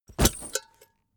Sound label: leather metal bodydrop